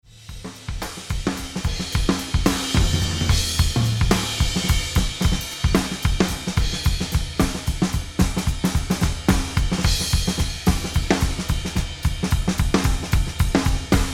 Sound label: Drums Room